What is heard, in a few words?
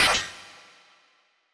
synthesizer metal